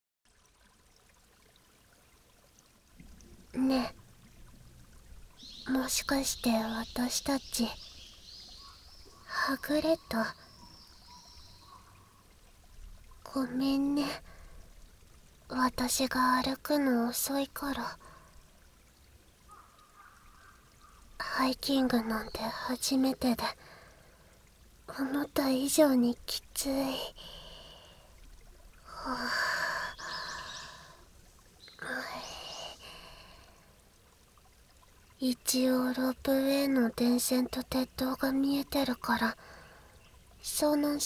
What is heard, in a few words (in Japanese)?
山で耳舐めサンドイッチ【BGMなし】; 宮村さんはいつも眠たげ; ごりごり耳舐めASMR・ささやき